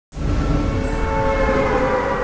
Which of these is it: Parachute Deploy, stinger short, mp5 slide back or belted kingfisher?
Parachute Deploy